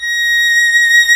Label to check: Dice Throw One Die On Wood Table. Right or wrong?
Wrong